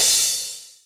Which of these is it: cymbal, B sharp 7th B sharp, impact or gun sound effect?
cymbal